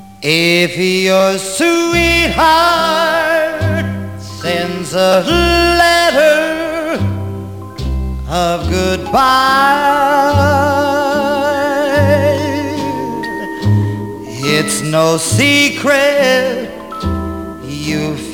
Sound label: cry